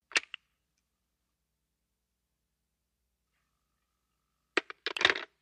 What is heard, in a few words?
British desk telephone, lift re; British desk telephone, lift receiver and hang up ( 1960`s )